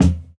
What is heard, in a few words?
Kick, jungle breaks drum sounds